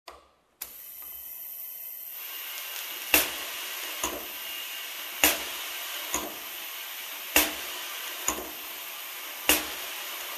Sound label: Welding and Hammering noise